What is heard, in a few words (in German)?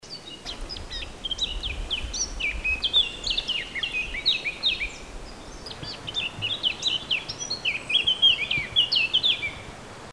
leiermoench, vogelstimmen